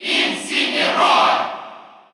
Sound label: Cheer Russian; Incineroar; SSBU